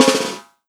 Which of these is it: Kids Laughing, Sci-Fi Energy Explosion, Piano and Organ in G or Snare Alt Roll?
Snare Alt Roll